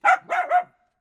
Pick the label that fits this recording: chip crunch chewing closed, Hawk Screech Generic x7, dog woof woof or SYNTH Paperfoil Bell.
dog woof woof